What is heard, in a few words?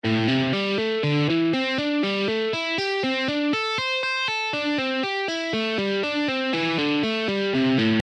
pentatonic, alternate picking exercises